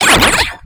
Retro Weapon Plasma

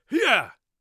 attack, Warrior Voice; Warrior Voice Pack

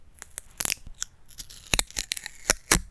Peel Sticker; Micro Pack

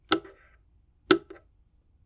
Model D - Switch, keyboard

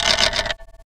Dice Throw; FREE SFX PACK VOL 1

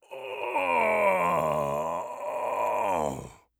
death, orc voice; Orc Voice Pack

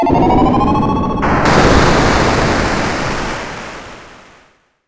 Retro Descending Water

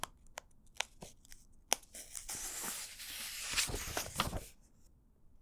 Riffle Turn Page, books, paper; Books, Paper, Writing

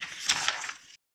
book; CC0 RPG SFX

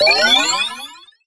Retro Charge Magic

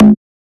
Error Buzz; FREE SFX PACK VOL 2

